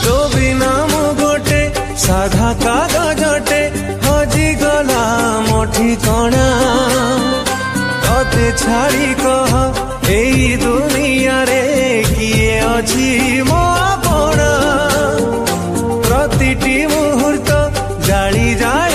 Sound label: Romantic Song